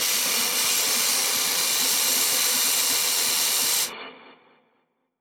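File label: Modulation Processed, alarm siren samples 125bpm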